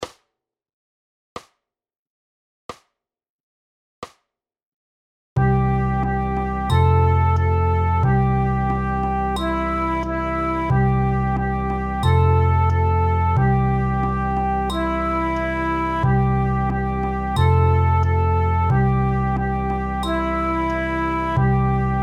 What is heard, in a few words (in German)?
lirum larum playalong